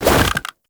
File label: Infested Jetpack Jump Woosh; Warframe